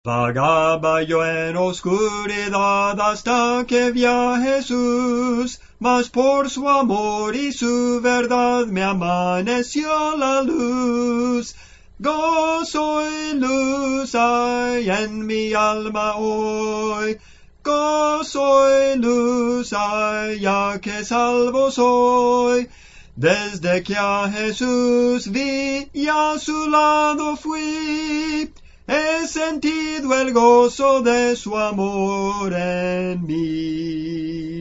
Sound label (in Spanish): Soprano, Cantos Espirituales; Soprano (melodía)